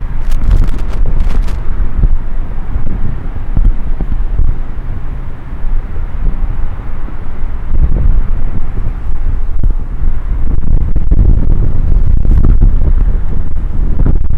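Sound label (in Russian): ветер дул с балкона перед грозой зимой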